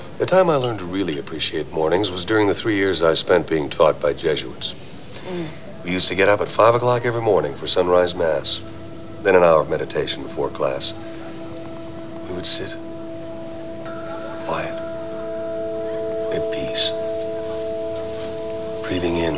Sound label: breathin